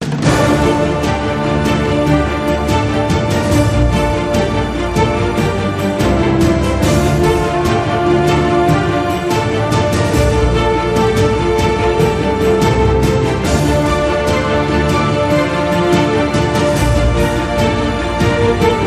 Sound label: No Choir